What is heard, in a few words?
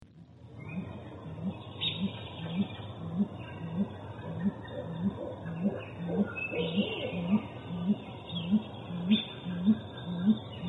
GGOW male & female drumming